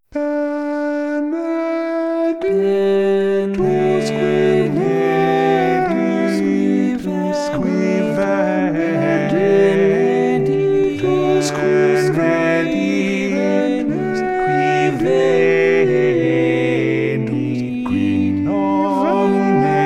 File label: Equal Voices; (Orlando de Lassus) “Benedictus”